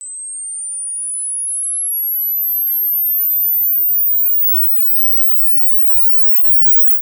Foley Generic Camera Flash Charge

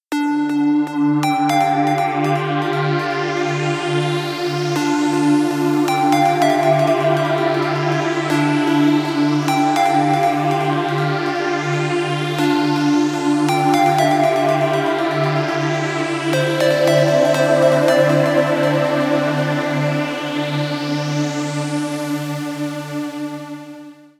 Space Intro

